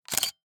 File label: camera shutter picture taking; Eiravaein Works; Start Select Vol 2